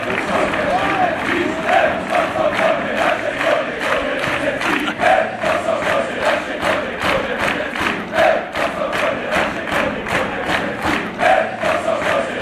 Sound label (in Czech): brno fanchants